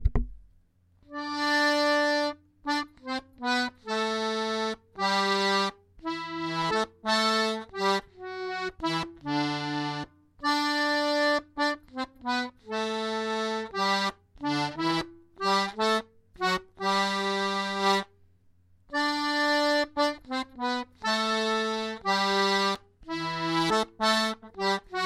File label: Schottische - slow; Harry Coxs